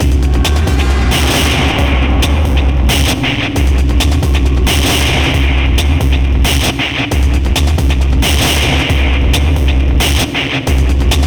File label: Fight